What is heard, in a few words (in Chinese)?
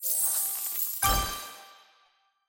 主线关卡领取机票的音效; nba